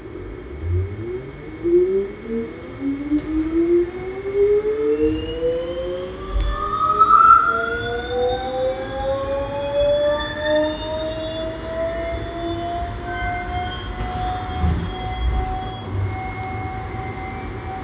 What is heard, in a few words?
lille subway noise